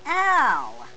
Ow!; WhackIt! Weenie Edition

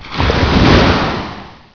waves

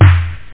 tr-808 snare

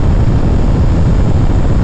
AB ROAR; PC Gamer IT CD 47; USAF; USAFDEMO

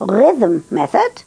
rhythm

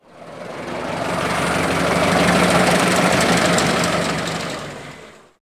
Car Van; Heist Demo

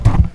bomb drop; Falcon 40

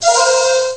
magic flute

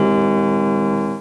Lost Last Life; Barrack 1.0.0; Barrack Sounds

